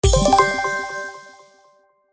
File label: Spin Wheel Reward Prompt